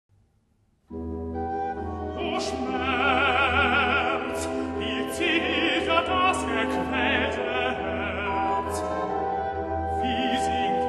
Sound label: tenor koor; recit. o schmerz wie zittert; bach